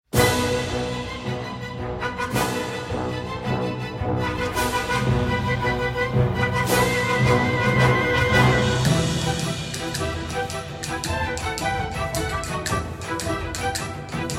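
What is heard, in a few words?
music Battle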